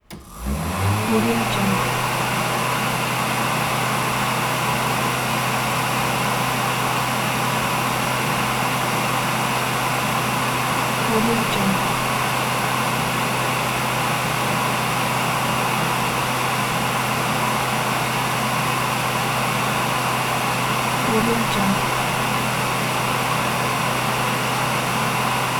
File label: hand dryer electric; soundjay